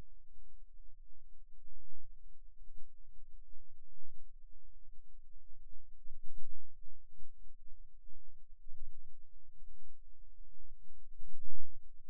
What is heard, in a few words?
Rhythm Wave, eeg seizures